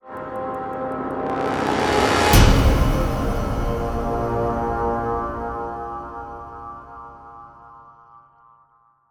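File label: sting power swell hit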